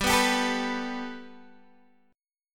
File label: G Major Add 9th, Guitar, Open D; 17,14,17,15,14,x; down